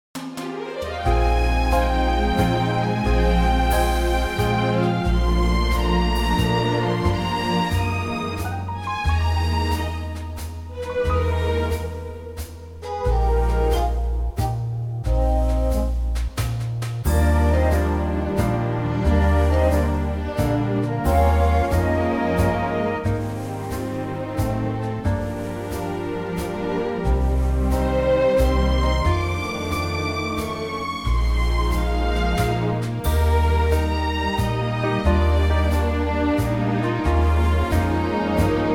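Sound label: Eb orchestra; key - Eb - vocal range - Bb to C; modern waltz; gorgeous orchestral arrangement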